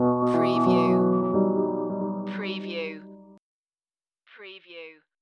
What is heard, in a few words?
Hip Hop Dark Rhodes Licks 90 BPM Swing - B